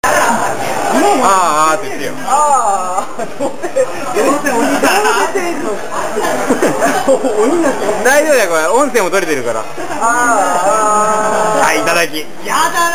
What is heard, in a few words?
ahah